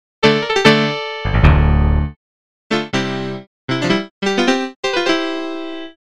electrikeys yamaha cp70 brig

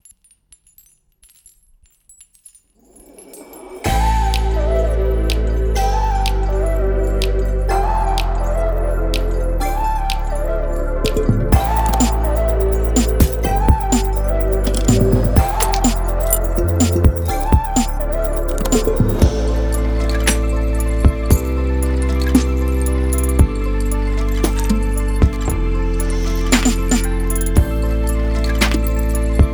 glass

